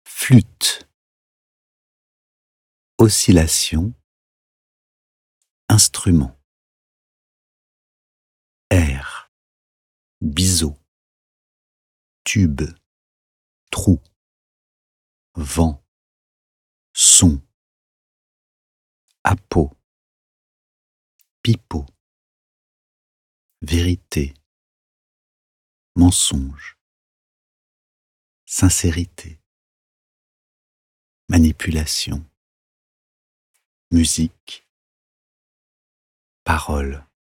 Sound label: flute; calligrammes